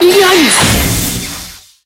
hit

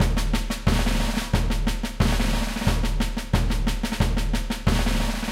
stage clear

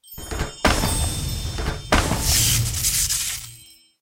shop gacha start

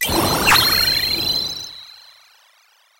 item shield; SpaceTrain

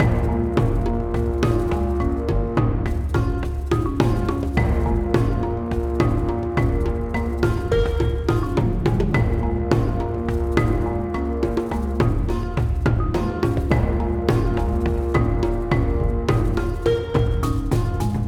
bgm gacha gear

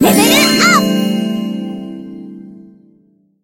level up

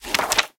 popup open

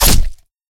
hit

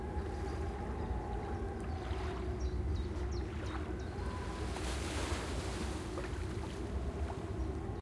ambi main

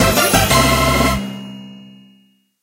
lab training success

